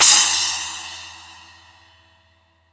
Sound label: SPLASH; East Collexion; Drum 1 Dry